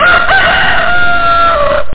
公鸡叫

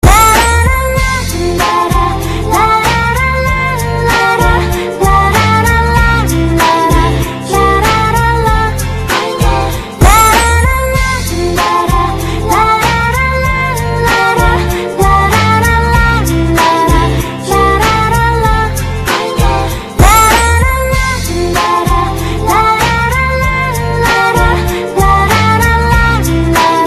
oppo广告曲 广告铃声; 步步高手机广告铃声

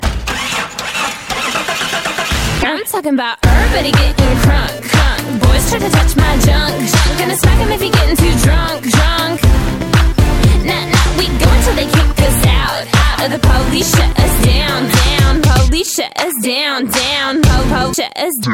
英文女声歌曲

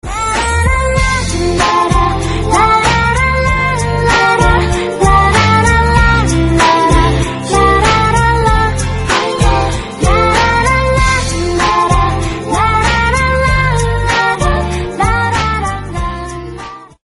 广告歌 广告铃声; 步步高手机广告铃声; oppo

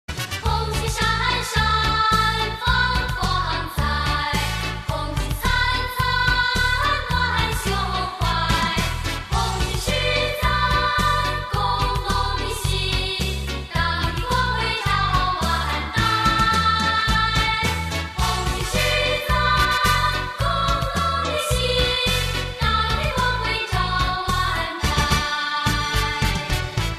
儿歌

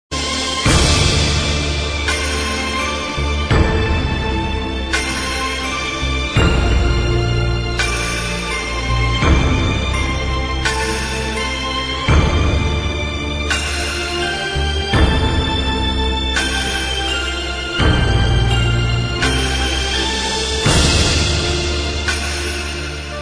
宫廷戏背景音乐

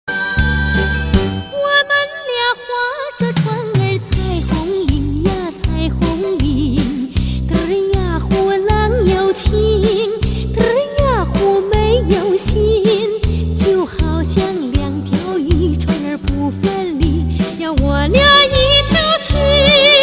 民歌戏曲

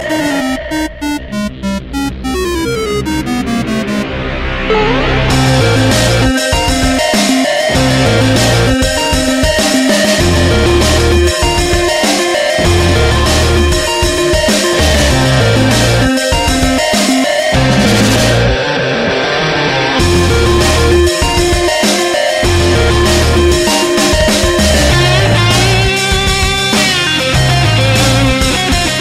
超正摇滚劲爆

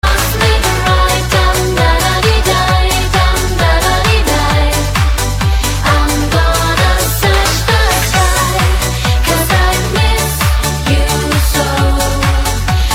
好听的舞曲女声

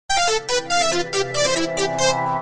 Nokia 广告铃声

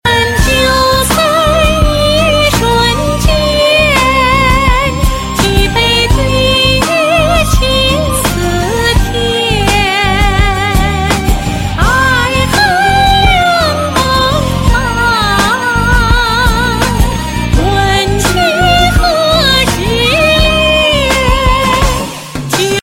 (女声段)

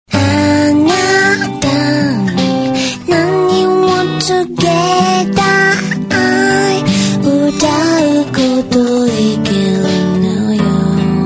慵懒纯净的女声

